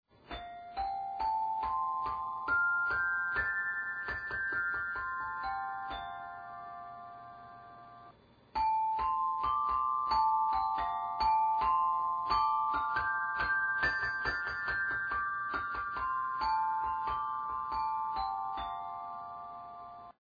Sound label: Boltaphone mono